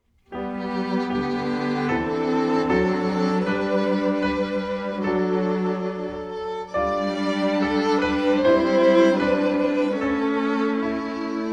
loving shepherd prelude music only

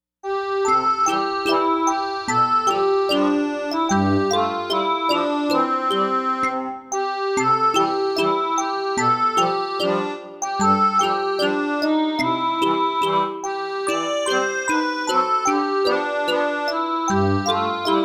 music only

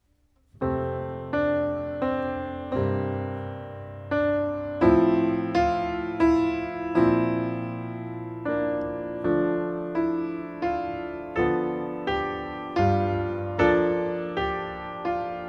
music only

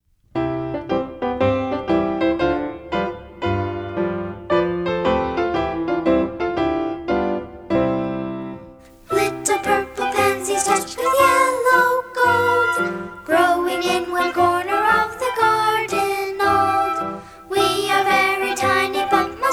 little purple pansies words and music

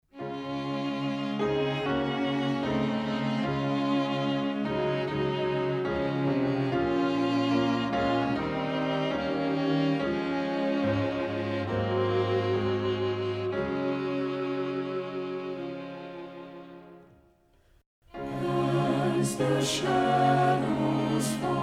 words and music

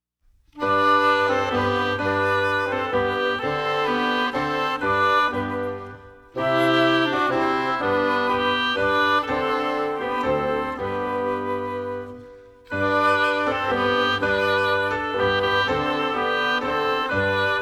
the books in the old testament music only

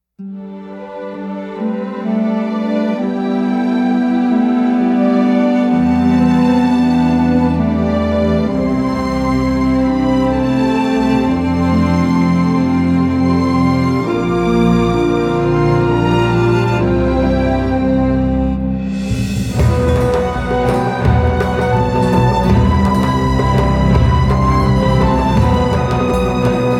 music; book of mormon videos

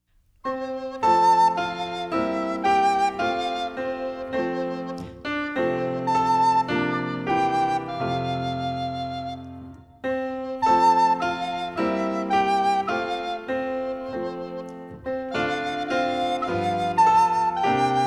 music only

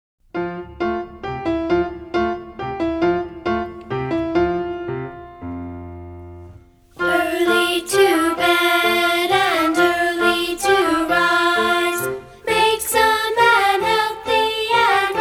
words and music

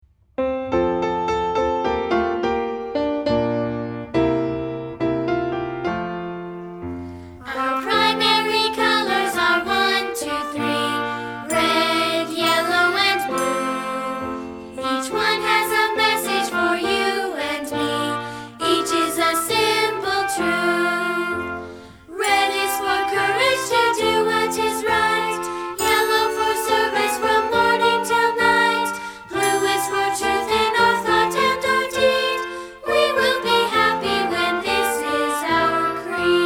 words and music

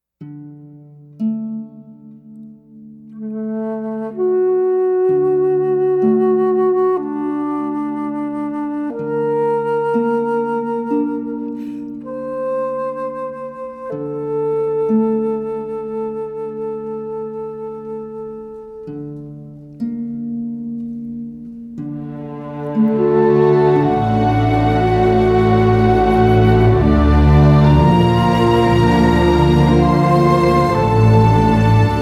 music; book of mormon videos

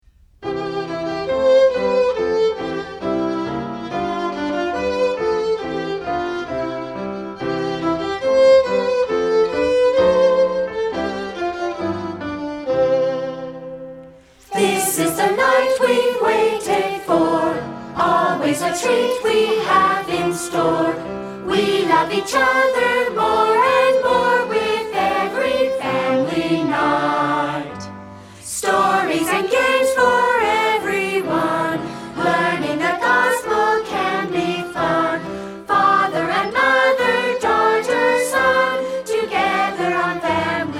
words and music

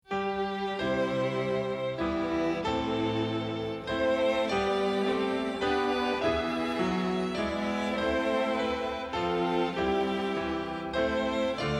words and music

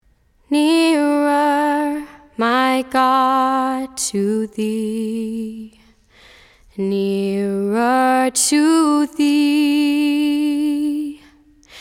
nearer my god to thee live

